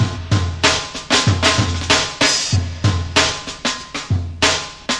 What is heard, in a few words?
roomy breaker, drum loops and breaks, 96 bpm; 091 to 100 bpm